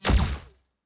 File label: Weapon Shoot; Sci-Fi Sounds